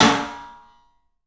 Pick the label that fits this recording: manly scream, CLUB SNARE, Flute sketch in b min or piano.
CLUB SNARE